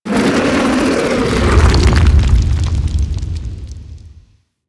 Burrow Enter; Ambience; Golden Maw; Warframe; The War Within

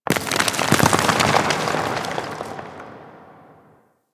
Moon Dust Fall Medium, Ambience; Warframe; Orokin

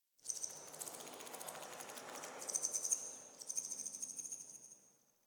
Tree Distant Critters Scared, Ambience; Warframe; Duviri

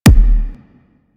Grenade Punch Common One; Warframe; Venus; Venus Grenades; Venus Grenade Punch Layers

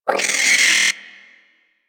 Hyena Micro Vocal Angry; Warframe

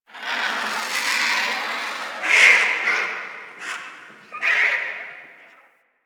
Dungeon Island Vultures; Ambience; Duviri; Warframe

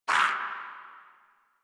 Pain, Dialog, Barks, Battle; en; Warframe; Grineer

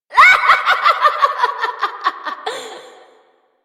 Evil Twin Doppelganger Laughter; Warframe; Entrati

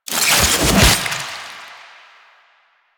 Save Chest; Warframe; Kuva Lich; Finishers

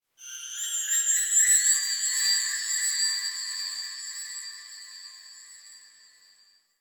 High Texture, Ambience; Warframe; Quests; TheNewWar; Zariman

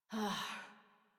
Breath Relieved, Adult, en; Warframe; New War; Adult Operator

